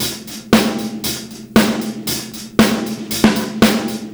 116 no kick, Beat Loops; Zero-G; Monster Beats

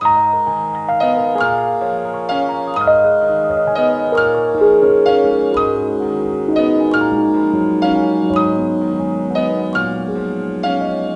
Rhodes Piano Combo, Cinematic Funk

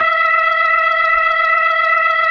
E5F, B3

